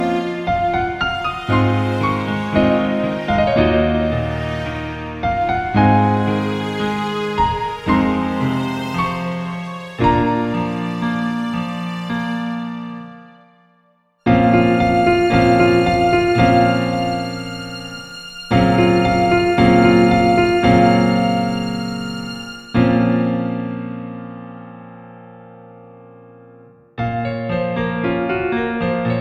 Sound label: Piano String